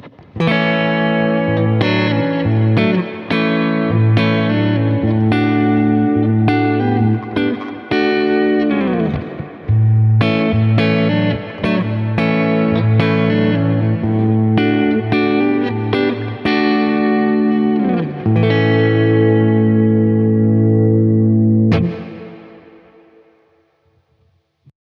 Reverb Decay Full; Prussian Blue

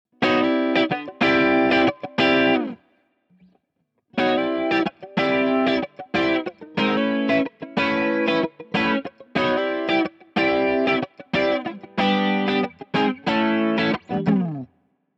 Boss DC 2 S 3 Stereo

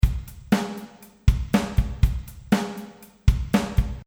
Beat Plate

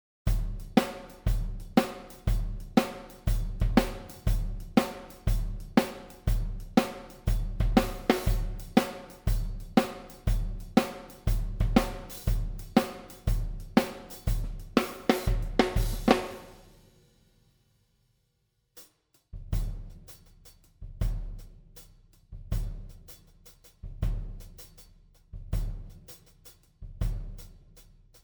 Superior Drummer 3 Ludwig Classic Kit

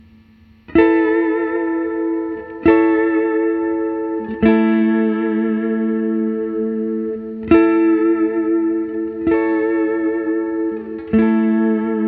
Clean Hall

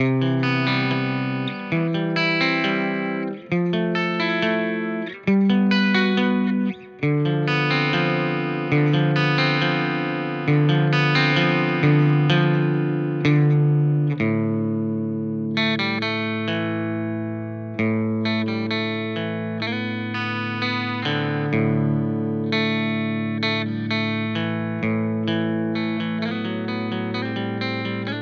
Neural DSP Quad Cortex Neural Capture Sound City

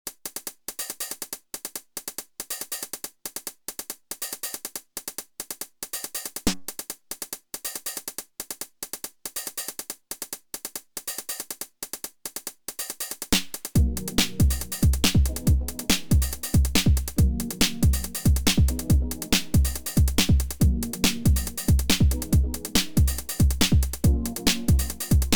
Electro Beat

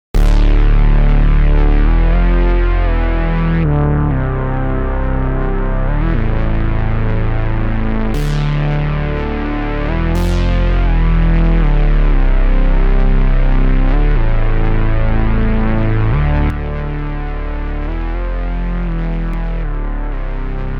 Soft Synth WAVES 100Hz 3kHz

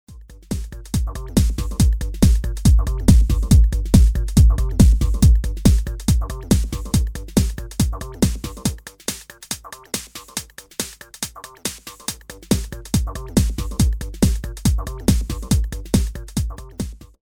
low boost cut

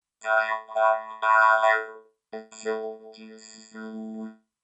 Vocals; Presonus Studio One 6

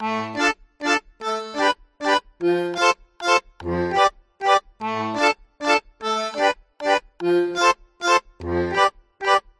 Bandoneon

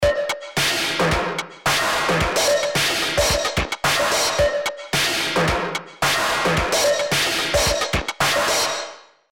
Drum Loop Crusher; Arturia CMI V; Dist OPAMP 21

